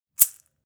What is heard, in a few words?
foot right; maraca raptors